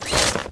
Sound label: mount dis; Tribes2